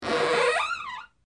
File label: door